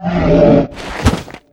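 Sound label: Npc Crow Damage; FlyForInsanity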